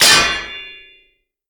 impact

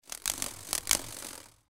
mantis wing; npc

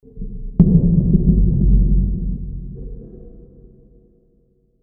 metal hit far; soundscapes; occultists

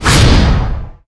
whoosh fist; gundyr

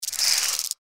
power attack vox; npc; cazadore

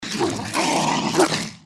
gecko snarl; npc

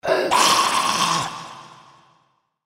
feral ghoul aware; npc

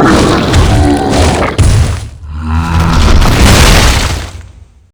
golem dying

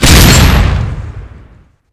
bomb explode; hornet

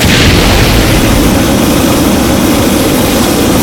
flame thrower start; kf2; weapons

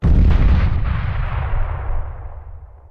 impact

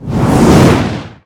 whoosh long; gundyr

